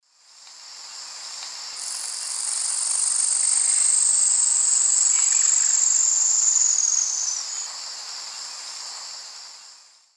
Heliodoxa schreibersii, song; S. America; Eastern Lowlands

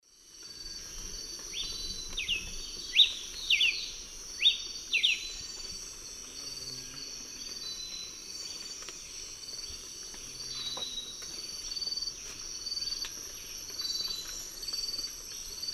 Ramphocelus nigrogularis, subsong; S. America; Eastern Lowlands

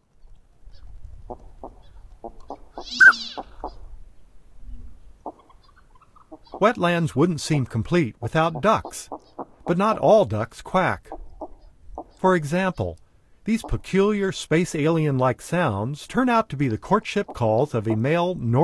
Northern Pintail; North America; Common Western Species; Know Your Bird Sounds

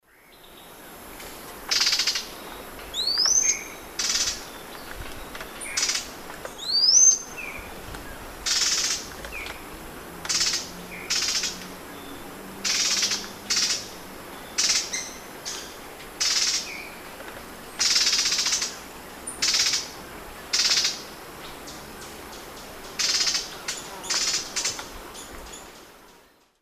Henicorhina leucosticta, alarm call; S. America; Eastern Lowlands

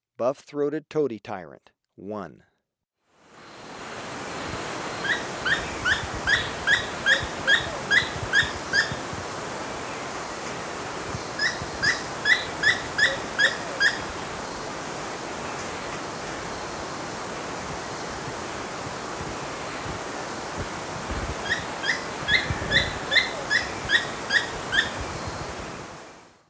Buff-Throated Tody-Tyrant, Birds of Ecuador; S. America

